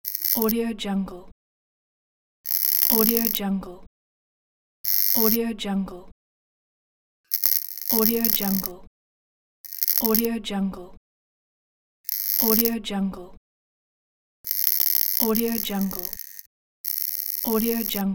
electric glitches; kaelv

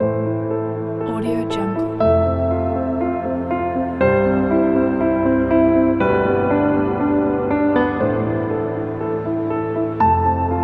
piano cinematic background story

